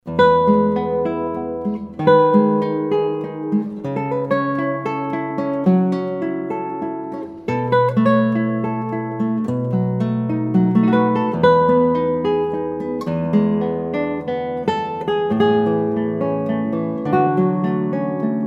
Solo Guitar

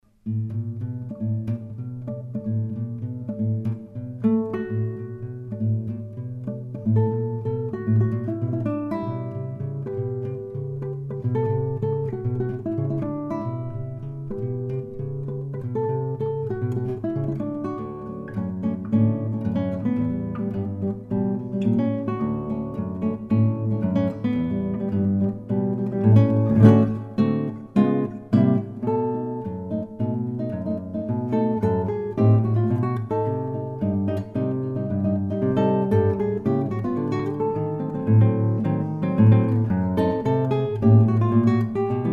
Solo Guitar

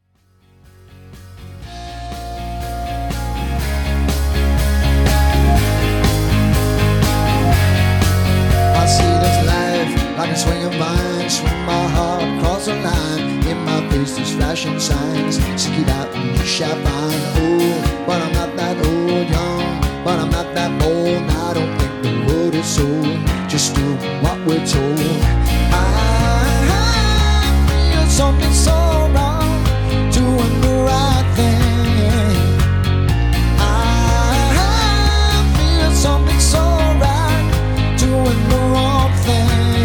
Rock LIVE

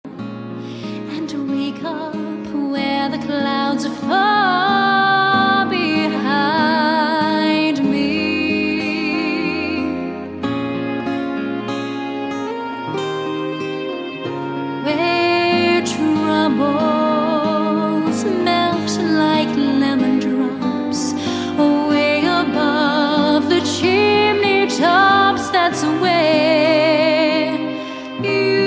Soprano

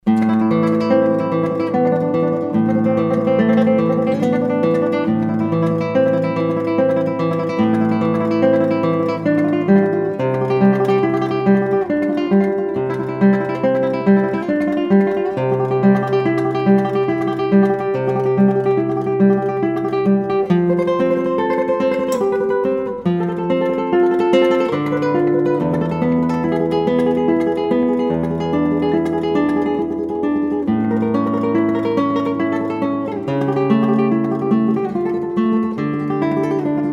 Solo Guitar Recuerdos

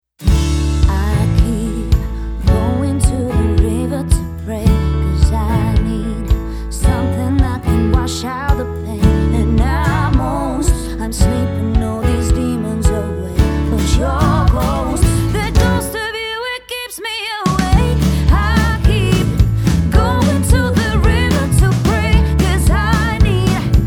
Folk/pop Band; Folk Fanatic; Folk Fanatics

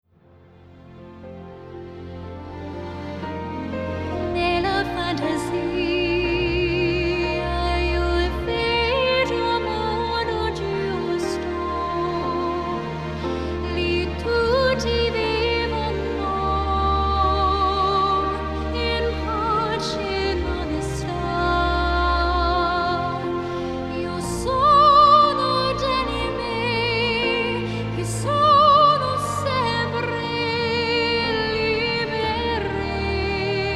Soprano

